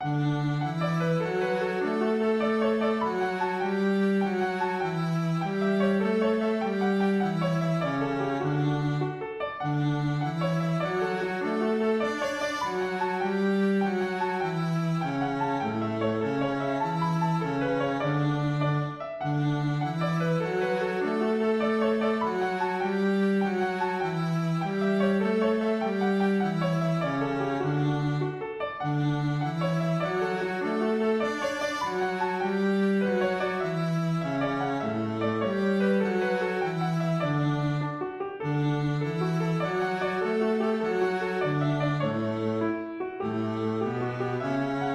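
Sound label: Jig MELODY and Viola Cello; Goldenrod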